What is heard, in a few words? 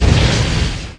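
laser bomb explosion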